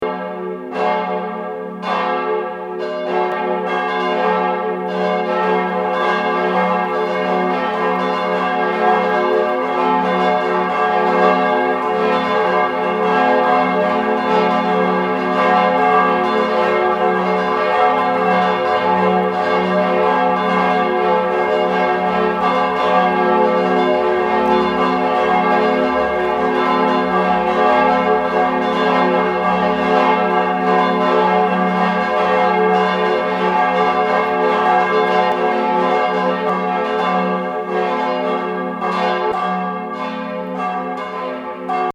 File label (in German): Christuskirche Hamburg